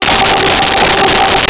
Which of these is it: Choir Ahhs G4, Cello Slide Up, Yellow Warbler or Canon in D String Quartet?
Choir Ahhs G4